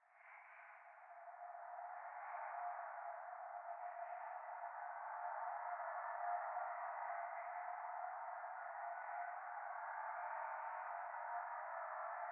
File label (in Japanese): 廃墟 左耳密着吐息 車の中